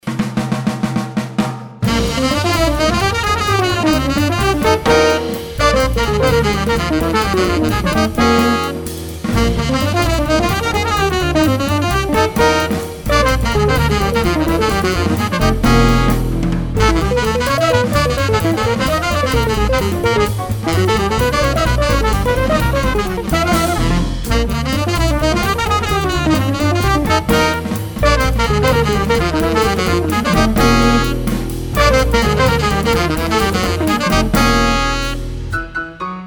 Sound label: Chords